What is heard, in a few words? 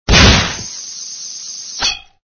Missile; mecha cat destructo